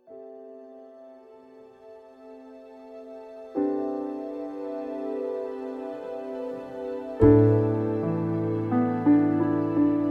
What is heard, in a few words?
instrumental